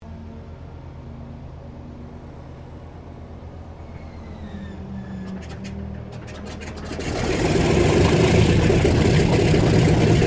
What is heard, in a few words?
AIRCRAFT DOUGLAS DC3 STARTUP IDLE; 1945 DC commercial aircraft; Airplane Sound Effects; Airplanes (Sound Effects:Vehicles)